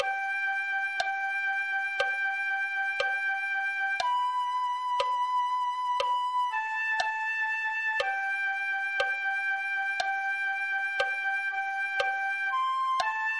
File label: flute 1